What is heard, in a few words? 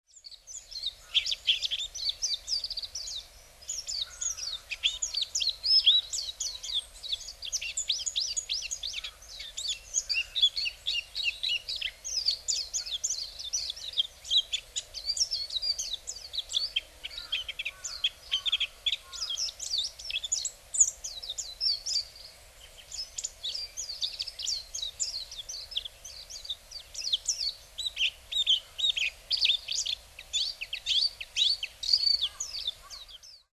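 Japanese White-eye; mejiro; hyogo; koe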